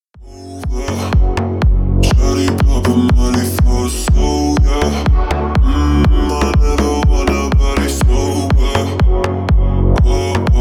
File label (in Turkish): remix rington; turkish